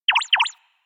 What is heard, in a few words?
ears twitch; anemoi